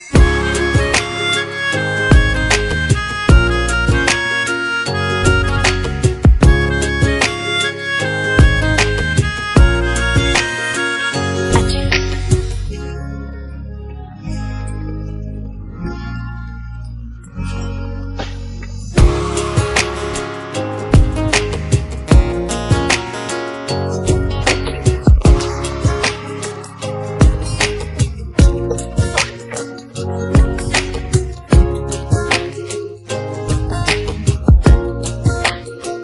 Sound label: Ringtone Instrumental